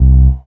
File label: DM BAS, Dance Mega Synth